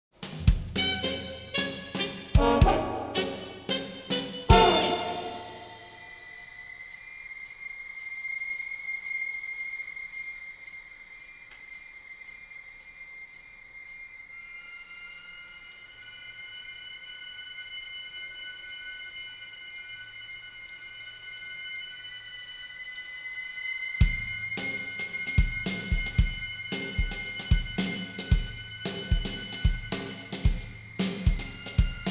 suspense - light; Neon Genesis Evangelion; Evangelion S2 Works

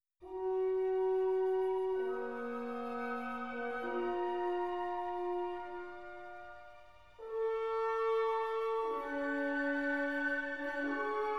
Symphony No. 4 first movement; romantic (Bruckner); Legend of Galactic Heroes; Galactic Empire SIDE

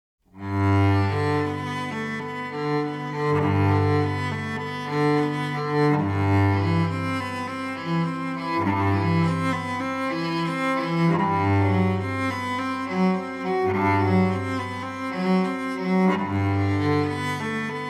cello solo melody; Neon Genesis Evangelion; Evangelion S2 Works

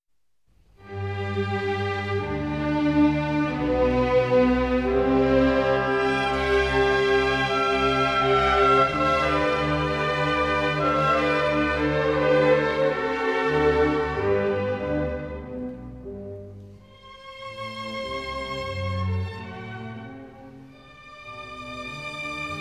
Flute Concerto No. 2 in D major second movement; Mozart; Legend of Galactic Heroes; Galactic Empire SIDE

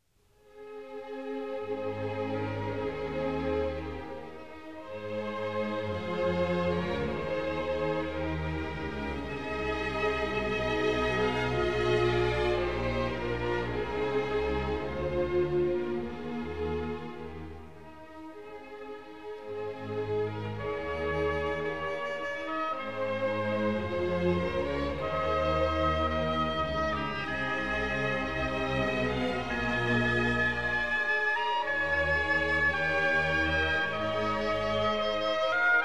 Symphony No. 4 in C minor second movement; tragic (Schubert); Legend of Galactic Heroes; Galactic Empire SIDE

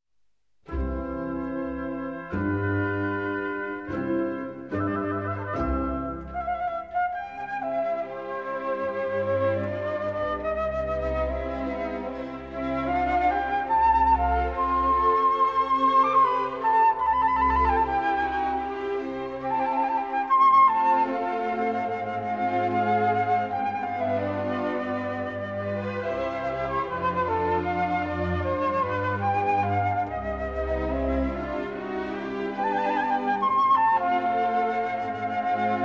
Andante in C major for Flute; Mozart; Legend of Galactic Heroes; Galactic Empire SIDE

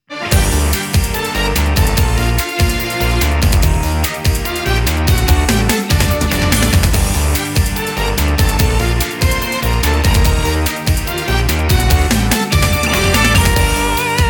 Flugel Instrumental version; Soukyuu no Fafner; Makabe Kazuki

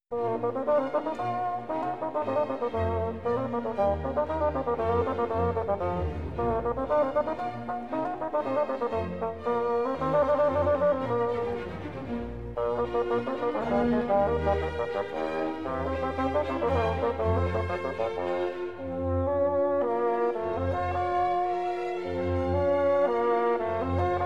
Bassoon Concerto No. 3; Mozart; Legend of Galactic Heroes; Free Planets Alliance SIDE

